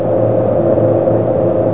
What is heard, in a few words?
ENGINE; shooter